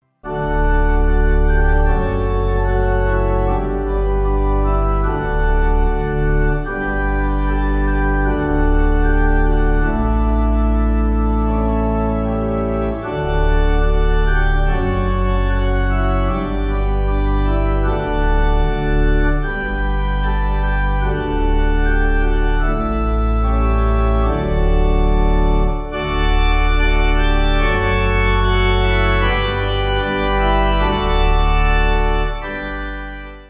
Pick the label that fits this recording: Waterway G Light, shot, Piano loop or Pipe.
Pipe